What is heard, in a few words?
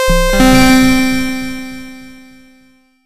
Retro Event